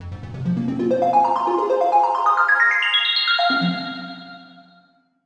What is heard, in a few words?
synth; Diffusion; Processed